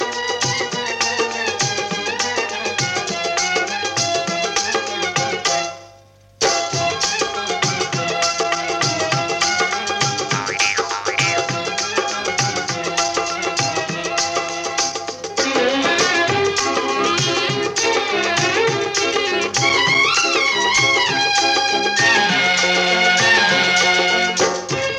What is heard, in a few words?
Instrumental; film family planning